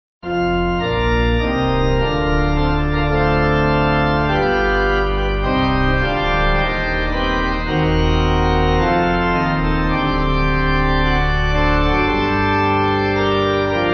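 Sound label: pipe organ hymn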